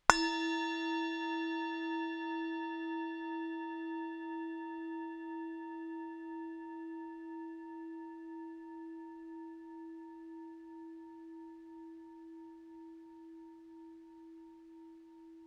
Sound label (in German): Klangschale; Buddhismus